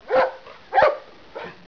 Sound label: dog